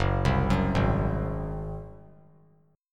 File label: F sharp Suspended 2nd Flat 5th F sharp, Chord; arpeggiated; JGuitar